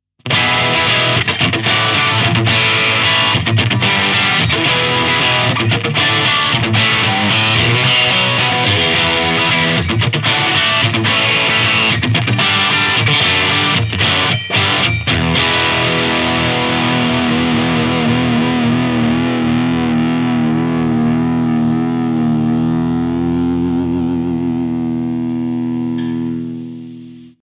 JAZZMASTER Lead; Fender AV II 66

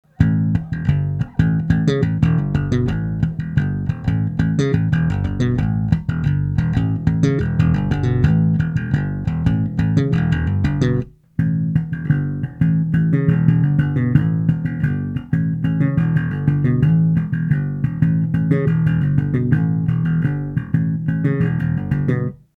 Slap Flat

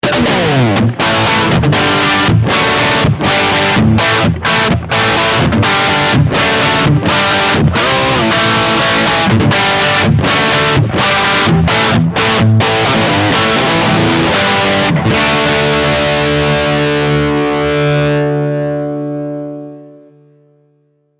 Crunch; DaddyO

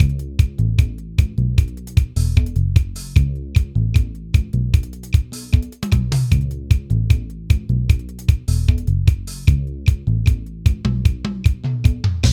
SR18 Rock

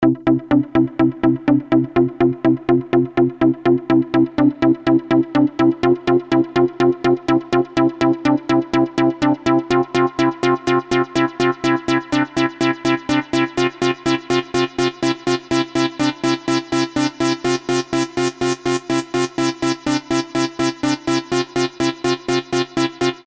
Duophonic; Behringer CAT

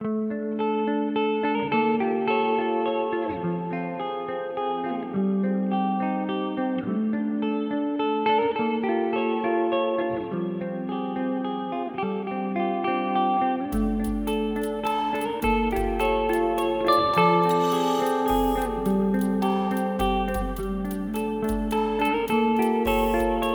Astral Destiny Abyss; Earthquaker Devices

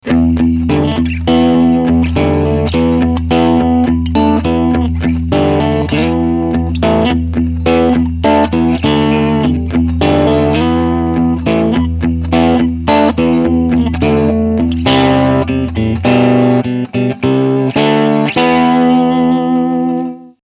Tube Amp Full Volume

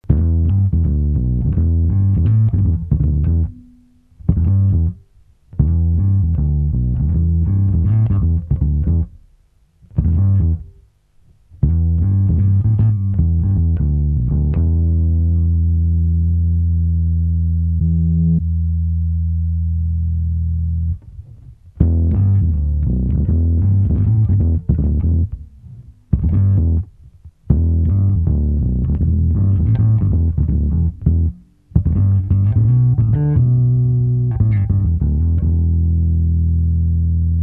lowdown

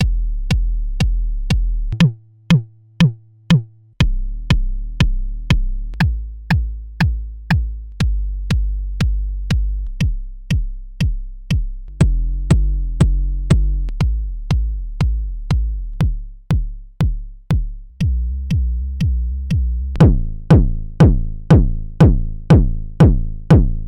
presets; mbase11

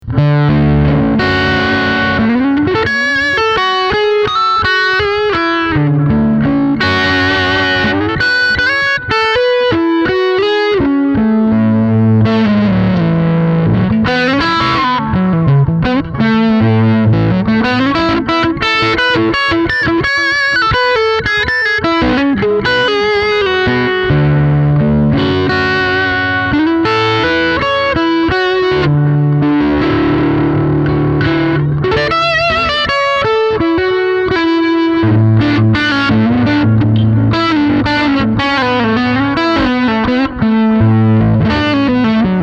Nux Mighty Air Lead 100 Delay

